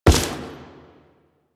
Step Default

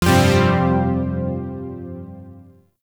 Shawzin Pent Min Chord F; Chords; Warframe; Zariman

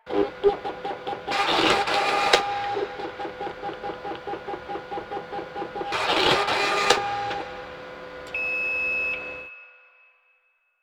Office Fax Machine Misc; Warframe; Ambience; Nineteen Ninety Nine

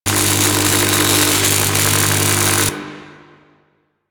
Mining Laser Loop; Weapons; Eidolon Plains; Warframe; Eidolon Mining Lasers

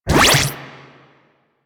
Spectra Stop 2D, weapons

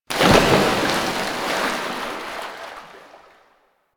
Queen Splash; Warframe; The War Within

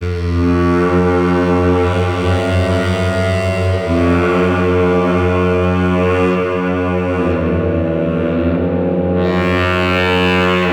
Castle Distant Dark Horn

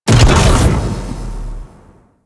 Stomp Shockwave; Warframe; Venus; Venus Jackal; Abilities

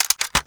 Foley Weapon Swap Accent Rifle; Warframe; Kahl

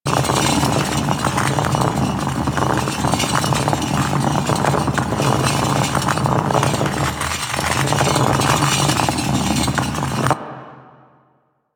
Ball Roll Loop Metal; Ambience; Props

